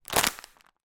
human blow air into potato chip crisp packet inflate